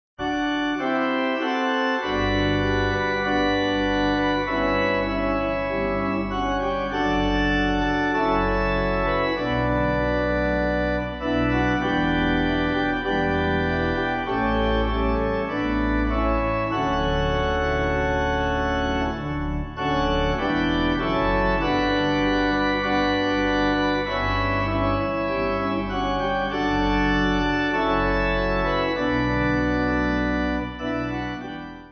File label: Pipe